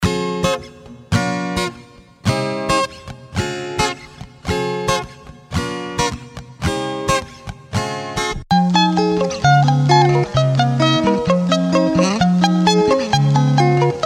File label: Strings Adventure; Audentity Records - Strings Adventures Vol.2 XL; EDM多风格吉他采样包